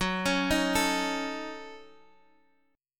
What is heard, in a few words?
F sharp Minor 6th, Guitar, Drop D; chordshape; down